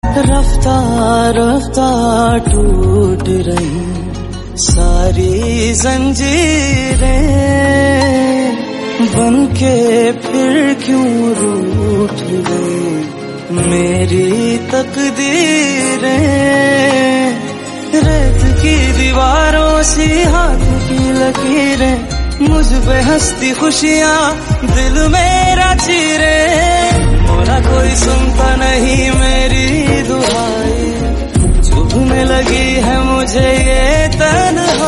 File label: ost hindi song; mohlat